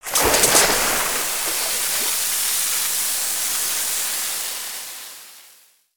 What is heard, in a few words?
Pet Rock Water Shoot; destructibles